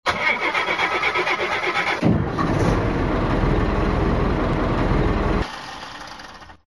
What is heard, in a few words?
Large Diesel